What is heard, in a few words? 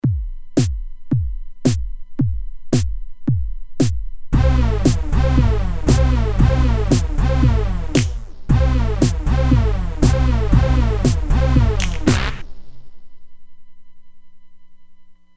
Panning Inverse Room, Roland DEP-5; Factory Patches